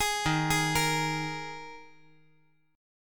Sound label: Eb Suspended 4th Eb 5G 4C 5E 5A; Ebsus4 arpeggiated; JGuitar